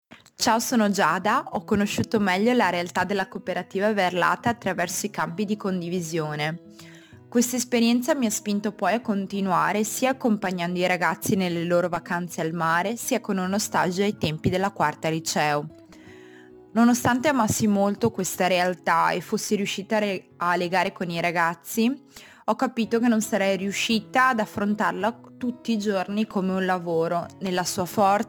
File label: MUSIC